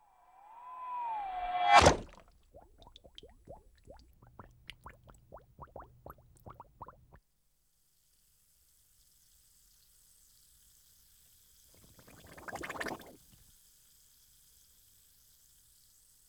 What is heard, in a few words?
Space Fantasy, Alien Pod Lands; BBC Sound Effects Library